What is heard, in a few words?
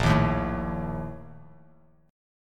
Bb Major 7th Suspended 4th, Bass Standard; strummed; JGuitar